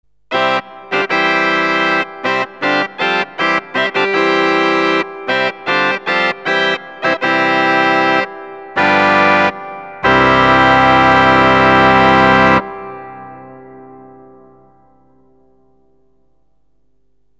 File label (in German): zungen plenum